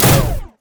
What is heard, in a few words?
rumble shield